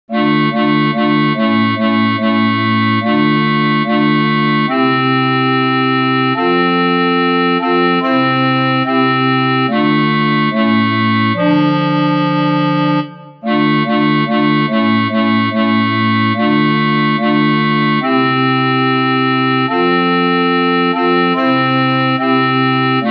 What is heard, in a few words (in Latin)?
Misericordias Domini TENORI